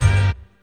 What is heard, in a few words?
woo